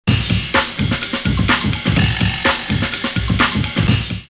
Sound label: drumloops Techno House 126; Funky Dreamer; X-Static Goldmine